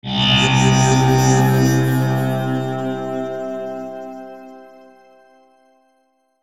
Logo Swell Resonant Filter Shimmer; Sonic Logo Sound Effects; Production Element Imaging Element Accent Transition

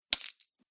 Treasure Coins Drop; Cartoon Sound Design

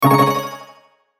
Hit Warble; Shimmer Delay; Warble Laser Malfunction Production Element Imaging Element Accent Transition; Blastwave

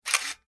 technology camera shutter release nikon f4; Electronics

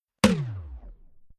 Wood Log Hit Slow Down Impact Drum; Sound Design; Warped

